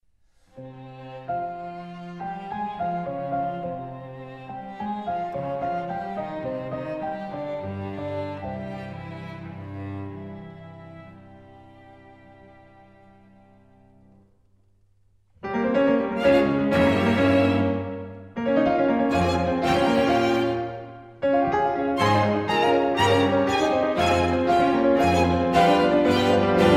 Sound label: Quintet; Brahms